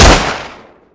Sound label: beretta; garrysmod